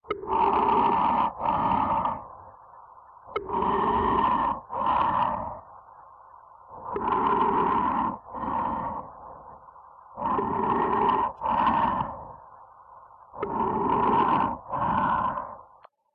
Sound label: eva suit breathing; Code7